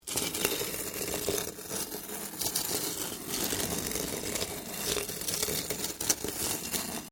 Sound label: Stone On Stone Small Scrape; Material components for constructing more complex sounds